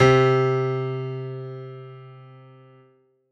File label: Piano